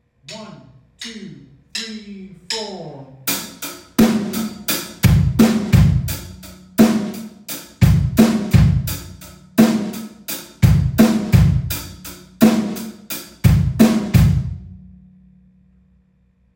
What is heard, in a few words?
eighth kick, drums